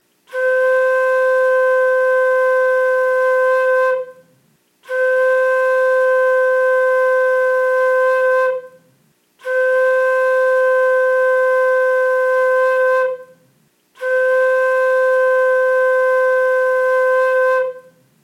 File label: flute c